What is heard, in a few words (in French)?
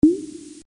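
imprim, printer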